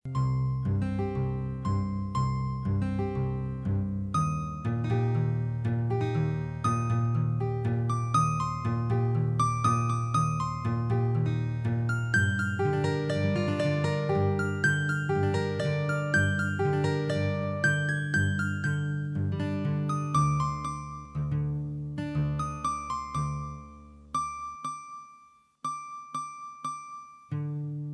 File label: Guitar